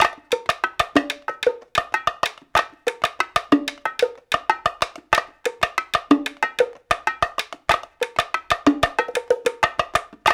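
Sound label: 93 bpm, bongo tonga; Tongatown; Spectrasonics; Supreme Beats; African Contemporary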